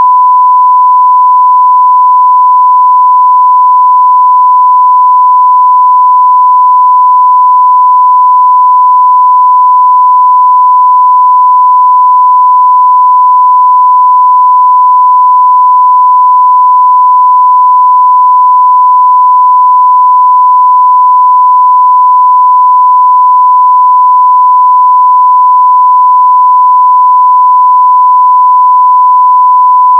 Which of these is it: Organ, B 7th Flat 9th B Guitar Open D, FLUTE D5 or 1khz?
1khz